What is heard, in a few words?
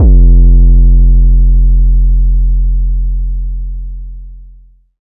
808 F1; Trap and Dubstep